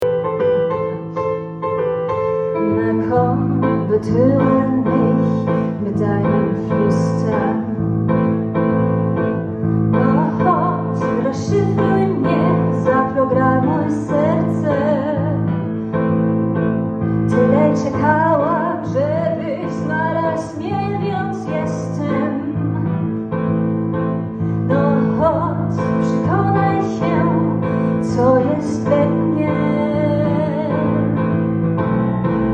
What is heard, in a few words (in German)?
polnisch deutsch Gesang